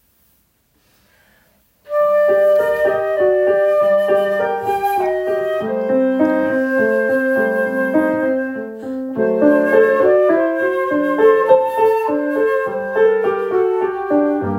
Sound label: Siciliano; J.S Bach